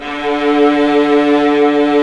VIOLAS D2

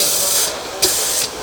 MACHINES

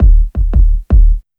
DRUMLOOP, Ragga & Dub Reggae

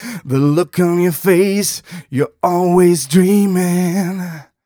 130 mel g, Vocals

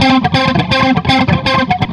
F Picker In C flanged, Guitars

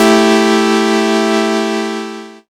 chord A; Techno Trance Essentials; CHORDS

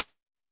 HH Close, Vermona Mono15 Drums Hi-Hats

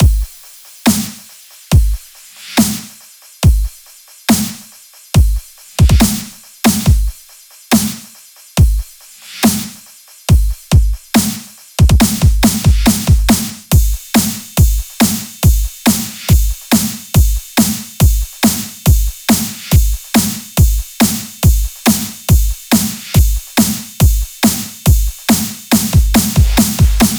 Break Beats, 140 BPM

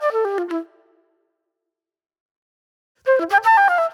Club Flute

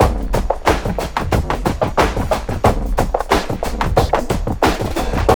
TRIP 90, trip loop

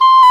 Oboe D'amore; Roland L-CDX-03 Disk 1; Oboe 4-8

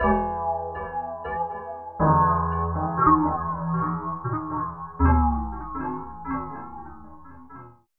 Tape Arp

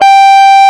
EG OD G4, GUITAR; AKAI S-Series CD-ROM Sound Library VOL-8

